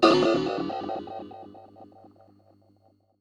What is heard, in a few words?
Ring Mod, Cymbal, contact mic; Murf & Ring Mod